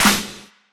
verby steel snare drum g sharp key, acoustic snare tuned to the G# note; snare drum samples